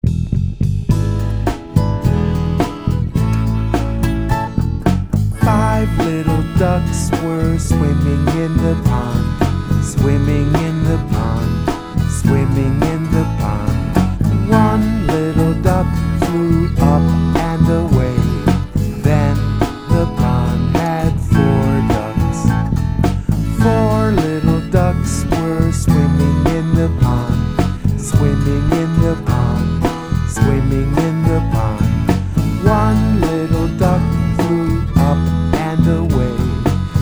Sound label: Five Little Ducks